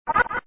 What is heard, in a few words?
Enemy Hurt